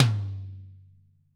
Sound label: TOM-TOM-L, ACOUSTIC KIT; Greytsounds VOL-1